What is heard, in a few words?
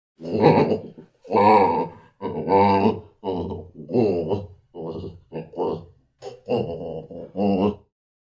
Baboon Groan Low Pitc